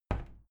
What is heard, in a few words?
window plastic squeak open soft